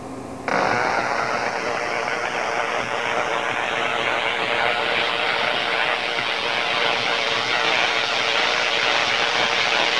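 Tesla coil arcs, the unique sound of 60Hz resonant charging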